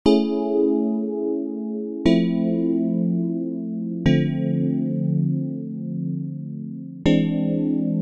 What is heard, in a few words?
Laid-Back Neo-Soul Vibe 60 bpm